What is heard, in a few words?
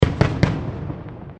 Fireworks Multiple Explosions; Fireworks (Sound Effects:Backgrounds); Crafted Signals